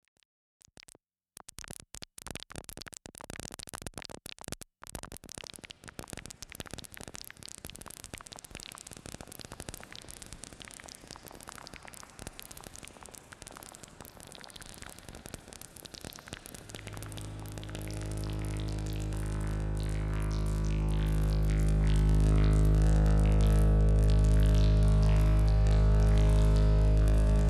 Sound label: Noise Fun